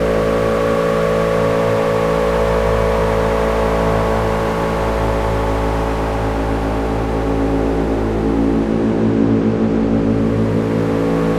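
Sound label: super fuzz pad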